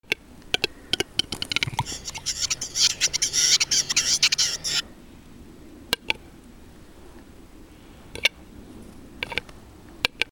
Stimme des Auerhuhns

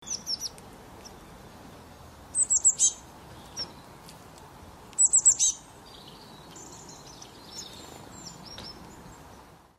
Stimme des Gimpels

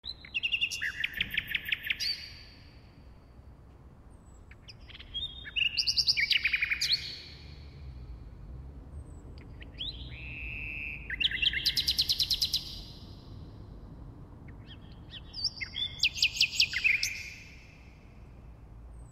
Stimme der Nachtigall

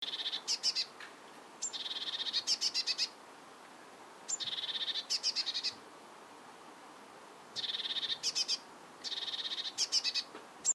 Stimme der Blaumeise

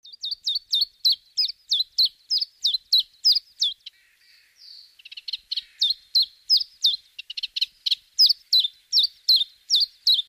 Stimme des Zilpzalp

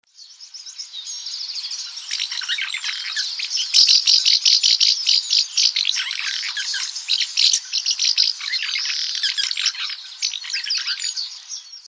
Stimme der Mehlschwalbe

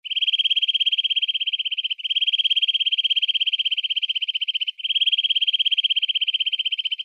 Stimme des Kleibers

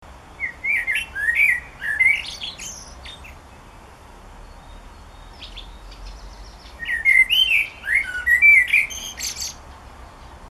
Stimme der Amsel

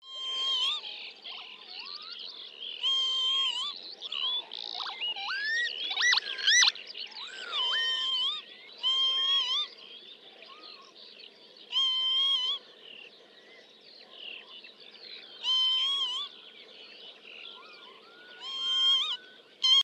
Stimme des Kiebitz

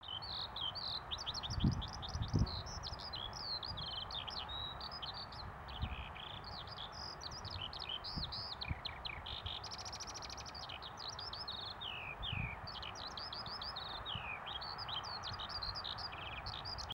Stimme der Lerche

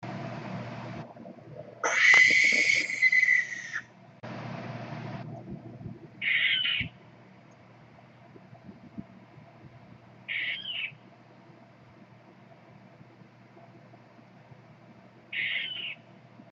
Stimme der Schleiereule

